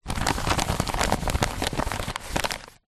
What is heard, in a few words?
paper crumple movement; foley